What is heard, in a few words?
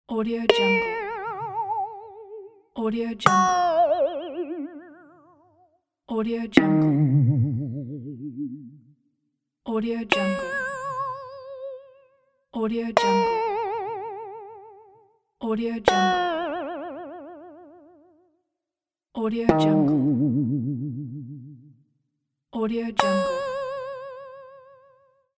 sound effect vibrato